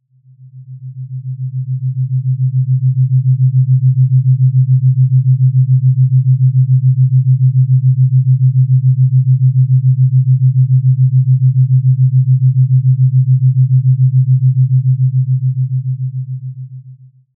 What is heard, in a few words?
binaural beats 7hz; alpha